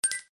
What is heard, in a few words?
collectibles jewel; Adventure Time - Break The Worm; ThrillGames